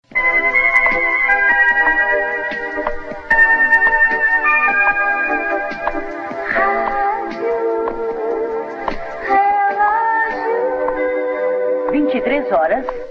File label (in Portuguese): Radio Relogio Vinheta